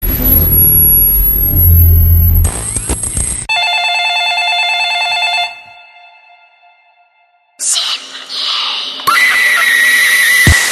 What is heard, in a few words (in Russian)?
rington na telefon s filma zvonok